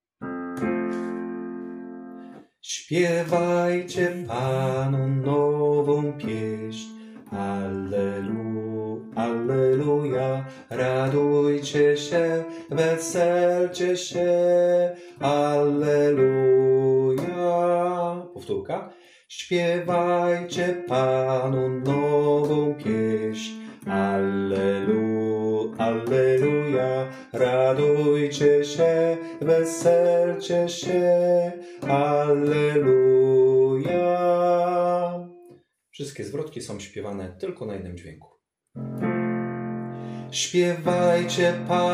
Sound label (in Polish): bass; spiewajcie Panu nowa piesn; nagrania glosow warsztaty2026